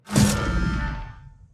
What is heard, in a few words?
Teleporter